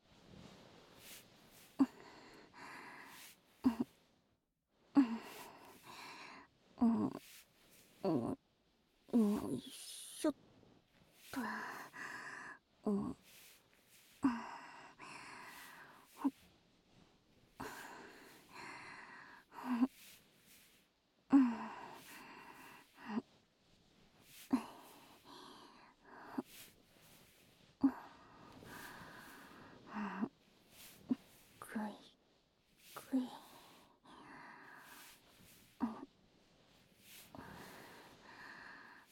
肩と背中マッサージ

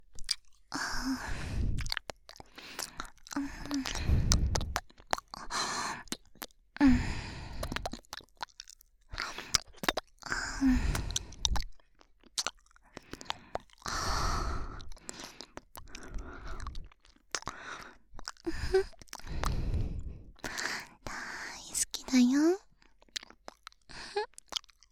耳舐めパート

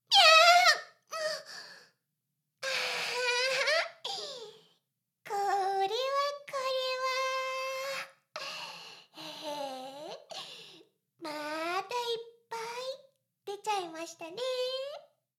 メイド シコシコ音声; ASMR; ダメなメイド

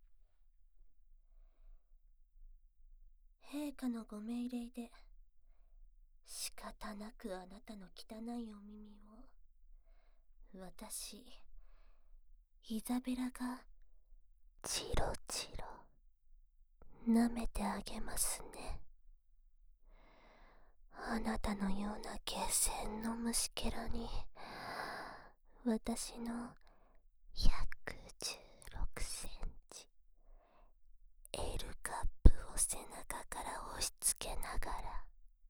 耳舐め; ドSで無慈悲な女王様に命乞いをしたらメスイキペットにされた僕; イザベラ

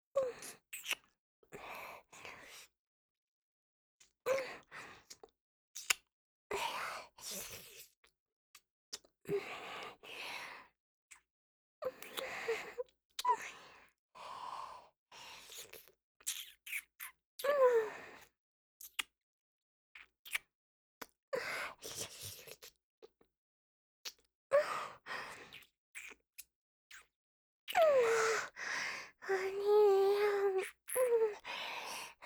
kiss, ASMR

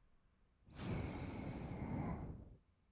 す, ブレス, 吐く音, kawaii voice; ASMR; 1200 kawaii voice!!

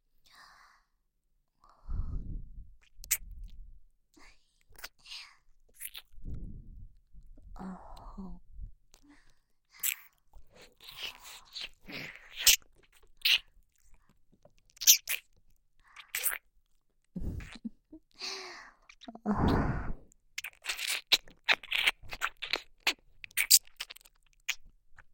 甘やかし耳舐め; 両耳舐め

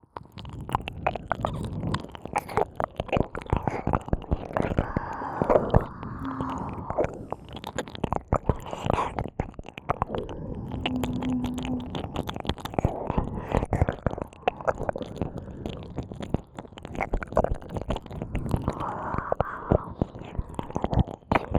両耳舐めループ, ループSE無

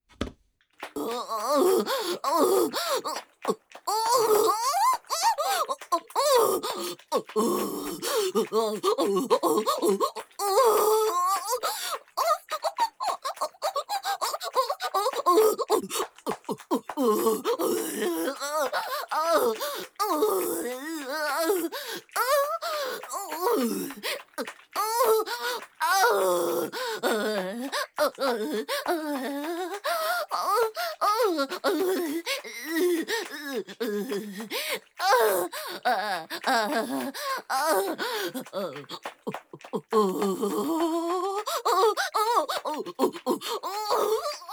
オホ声（SEあり）娇喘

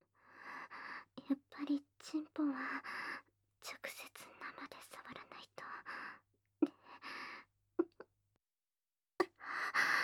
ディープキスしながら手コキ; ASMR

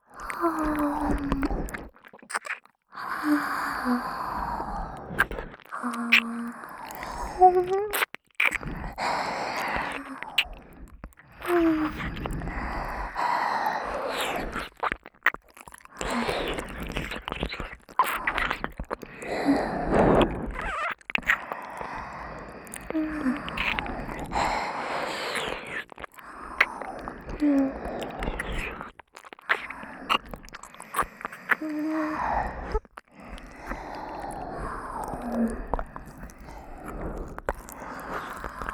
両耳舐め まったりめ

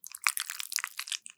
挿入; ASMR

